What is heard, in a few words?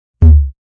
Bass Drum Single; Bass Button Sound Effects